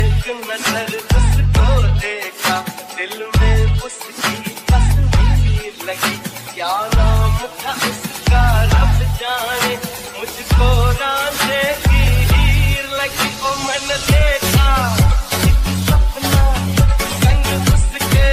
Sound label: ma bollywood ringtone